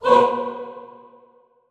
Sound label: HOO B2, Classical Choir SHORT HOO; Best Service; Extended Classical Choir